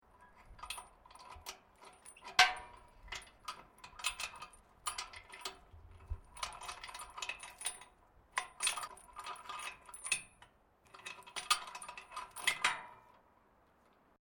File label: METAL CHAIN MEDIUM MOVEMENT; Materials